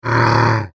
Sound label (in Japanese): クリーチャー ステレオ